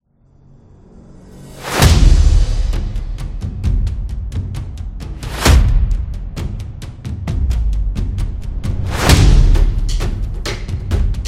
Epic Drums Trailer, war drums

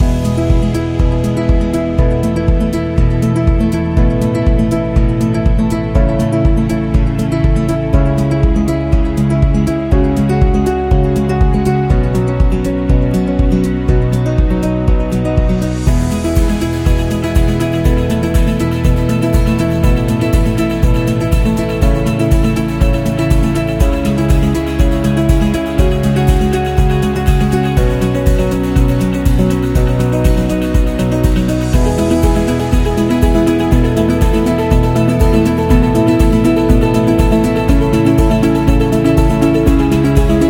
Motivational Loop

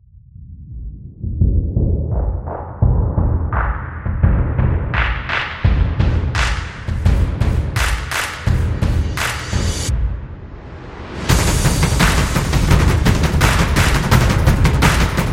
Drums Action; energetic percussion

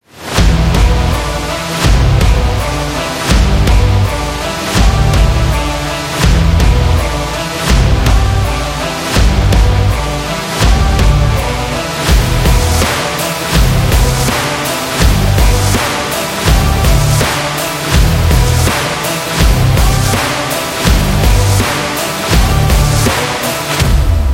Pop Rock; electro rock

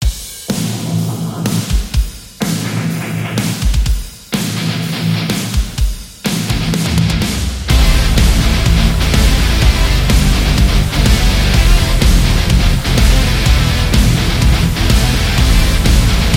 Melodic Metal

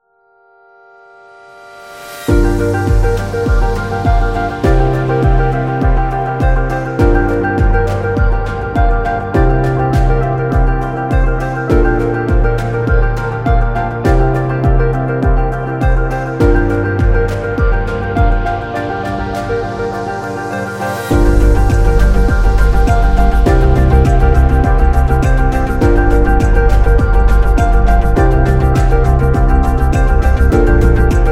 Atmospheric; healthcare

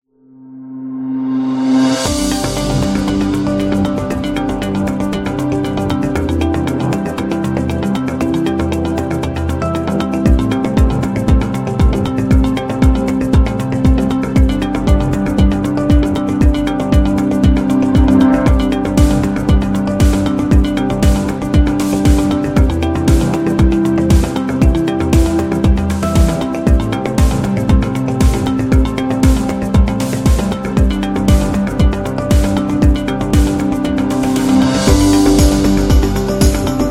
Progress Corporate; corporate music vol 18

